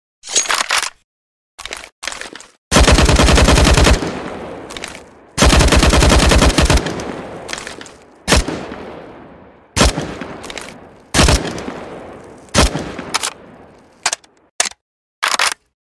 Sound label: aug cs go